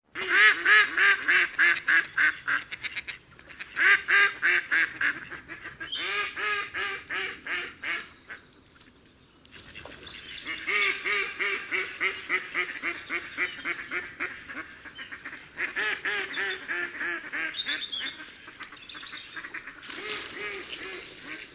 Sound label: mallard